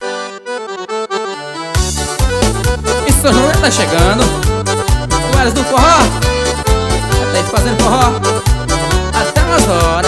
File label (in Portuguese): forro sao joao